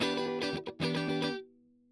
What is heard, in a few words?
Strum